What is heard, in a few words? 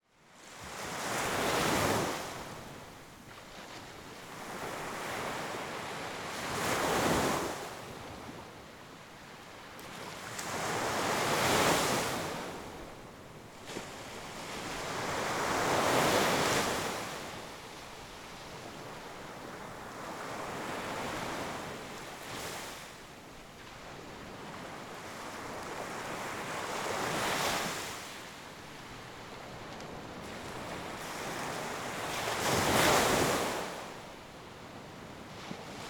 silent waves on beach; Rolige bølgeskvulp på strand, 30 minutter